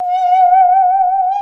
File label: saw danger, music, zombat